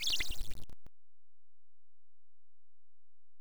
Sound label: water, watering can